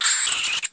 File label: die; squeek